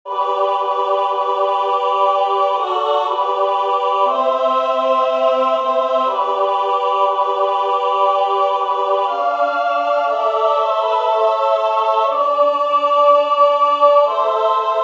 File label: Tenor; Costantini; Confitemini Domino